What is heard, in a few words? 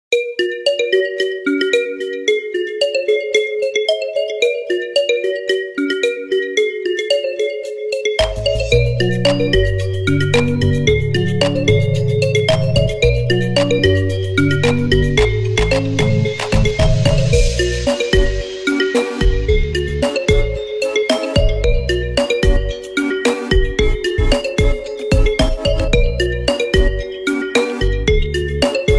Vivo Ringtone; Best Vivo 2024